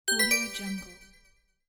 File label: notification incoming message